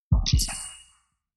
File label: Robo Body Alient High